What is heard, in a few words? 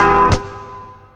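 organ stab; E Lab; XTc Files of House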